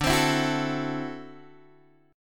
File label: D 9th D down Guitar Open G